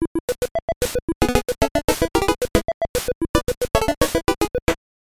Clay Shooting Intro; Duck Hunt

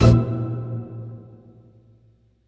Exiting Vehicle; The Simpsons Hit & Run; PS2; Plowing Through

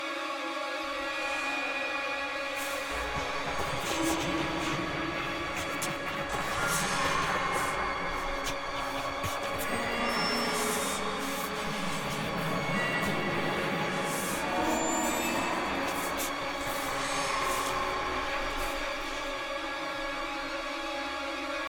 Timed attack Loop

